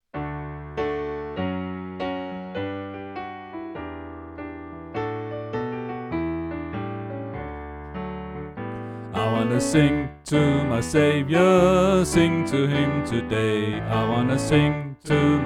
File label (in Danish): Sopran; Gospel; oevefiler